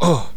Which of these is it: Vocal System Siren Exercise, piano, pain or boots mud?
pain